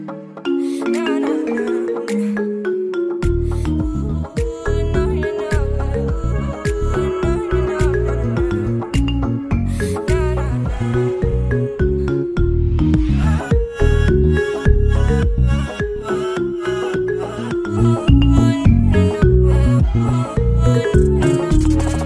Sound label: free iphone 11 ringtone